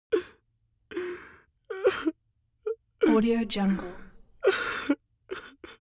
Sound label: human vocal male crying